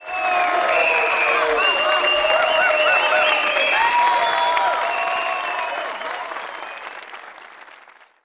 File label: Crowd Wild